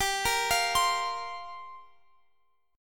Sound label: G Minor 11th G down Guitar Open F; Gm11 arpeggiated